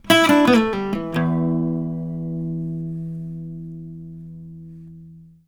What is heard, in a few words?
licks, Blues Guitar; ILIO; Fretworks